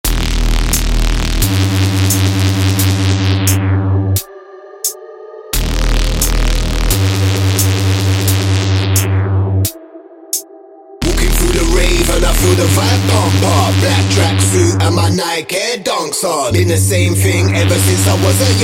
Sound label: jump up, Drum and Bass